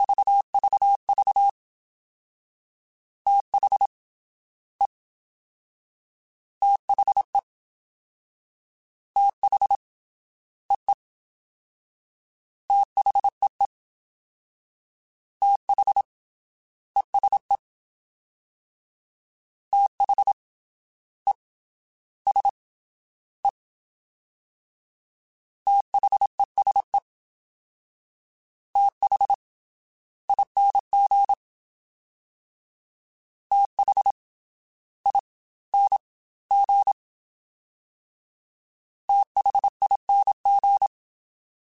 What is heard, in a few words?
Fast TH, CW Code Groups; Mixed; Prefixes